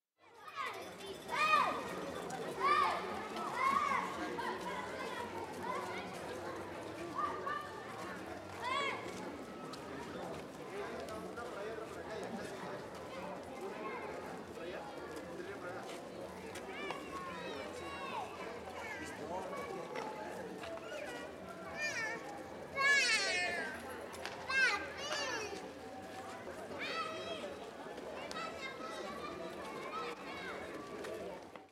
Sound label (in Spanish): NIÑOS EN PUQUIO AMBIENTE MULTITUD PREVIO A PROCESIÓN